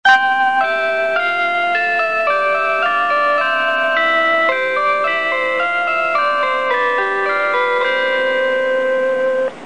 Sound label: train chime; スーパーひたち; ６５１系のチャイムです。